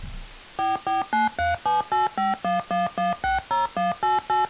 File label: touchtone synth